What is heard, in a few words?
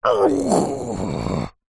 human male grunt ouch pain